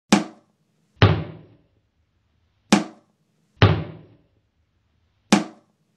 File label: Closing and Slamming a Cabinet Door